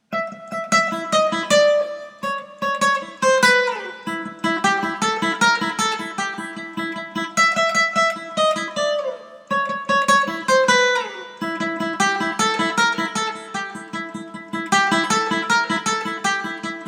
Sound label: guitar line tense